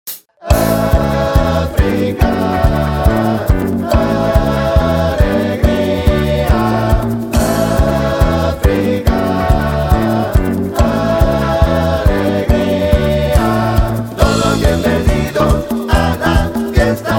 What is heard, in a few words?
Latin Band